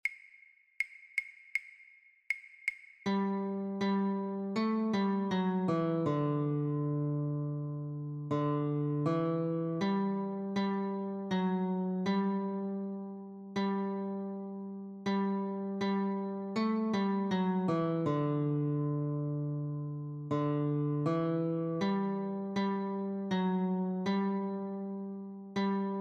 Notes, guitar; christmas

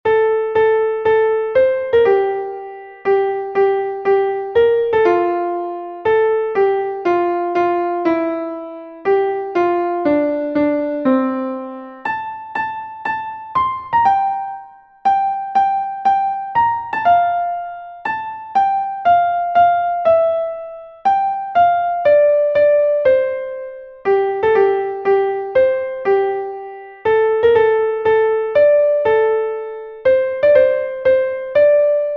La Donna e mobile, Classical Piano

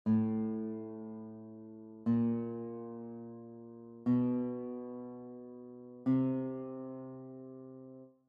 A string, guitar; childrens

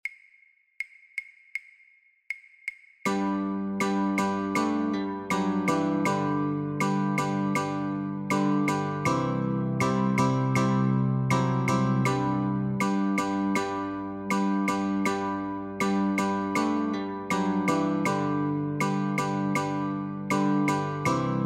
Notes & Cho, guitar; Ding Dong! Merrily on High!; christmas

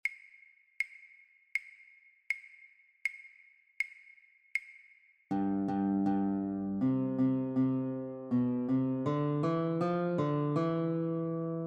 The Twelve Days of Christmas - Notes, guitar

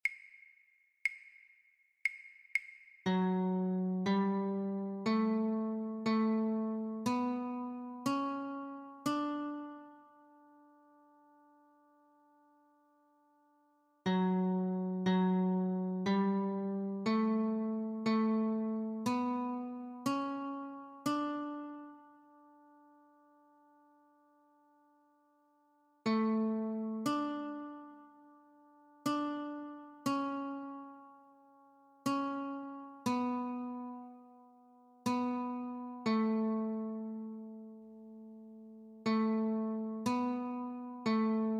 Notes only, guitar

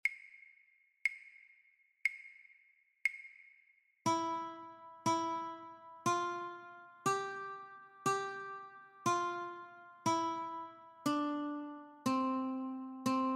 Notes only, guitar

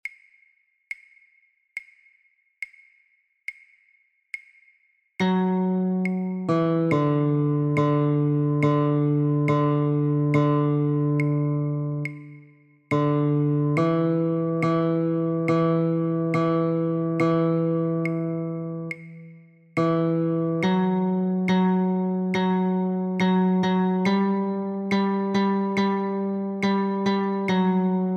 The Grand Old Duke of York - Notes, guitar

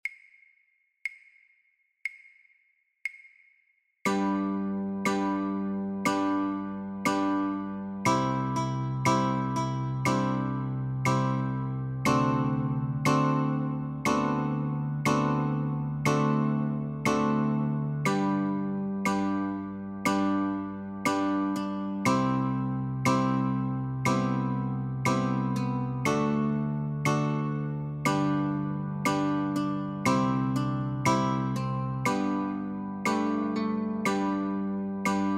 Baa Baa Black Sheep Notes and Chords, guitar; childrens

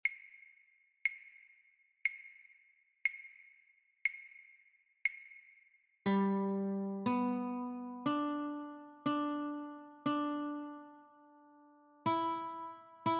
Notes Only, guitar

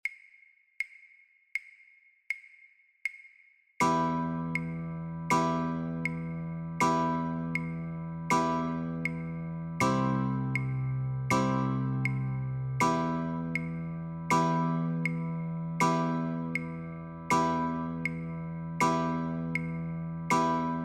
Chords, guitar; christmas

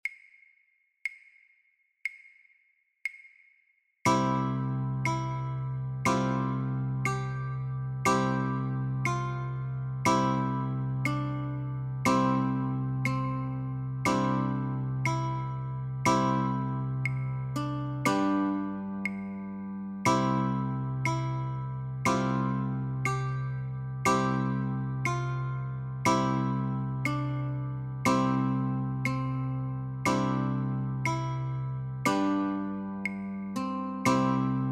Notes and Chords, Guitar

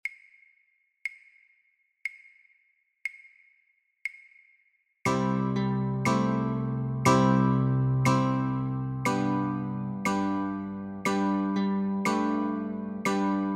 Notes and Chords, Guitar; Happy Birthday

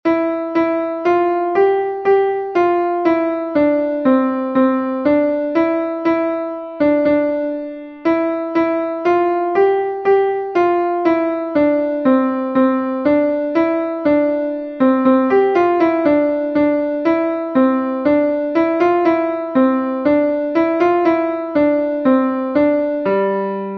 Ode to Joy, Classical Piano

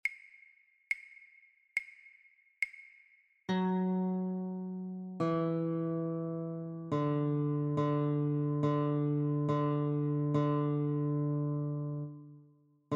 The Grand Old Duke of York - Notes, guitar

